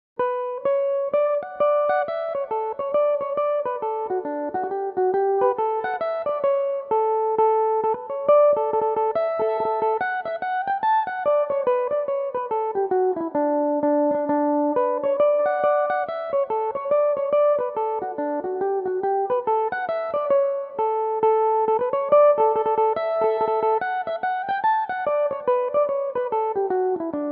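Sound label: D major, irish mandolin, tunes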